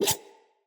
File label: Dagger Whoosh Heavy; Weapons; Enemy; Daggers; Sinecure Dagger